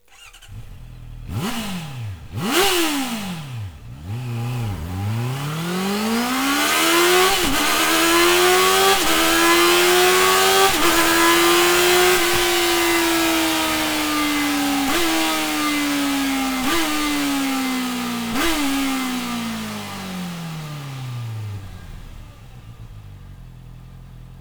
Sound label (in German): Sound Serienauspuff, Honda CB(R)650F; Akrapovic Racing Line (Titanium) Auspuffanlage für Honda CB(R)650F 2014-2016